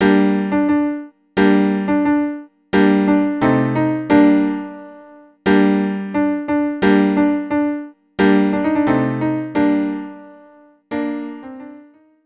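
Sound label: violin viola cello trio; les dragons; carmen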